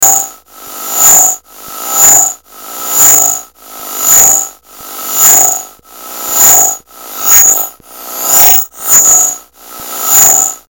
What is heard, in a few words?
techno